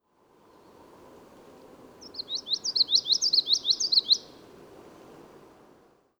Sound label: common yellowthroat